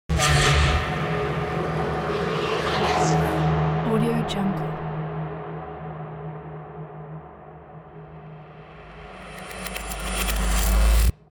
spooky scary fx; kipaloops

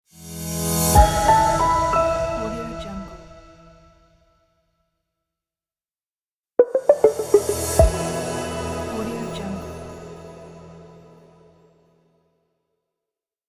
modern sting logo pack

